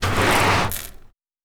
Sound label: Sand gravel scrape